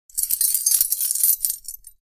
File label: Keys jingling loosely slight ring